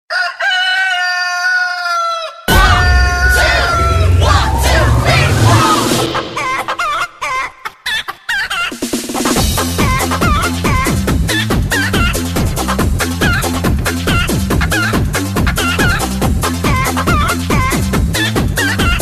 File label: remix chicken